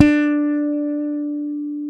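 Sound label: fret buzz D, Pedulla bass; Masterbits CLIMAX 7 - Bass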